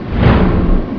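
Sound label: ambience fan slow; filtration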